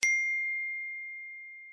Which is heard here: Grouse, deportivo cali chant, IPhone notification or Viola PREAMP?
IPhone notification